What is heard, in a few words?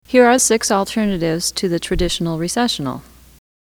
Alternate Recessionals, pianist; Weddings